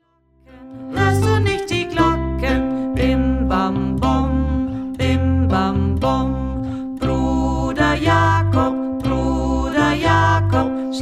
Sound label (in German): ohne ukulele; bruder jakob